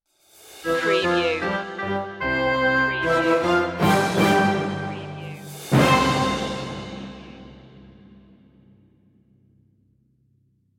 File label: we wish you a merry christmas orch stinger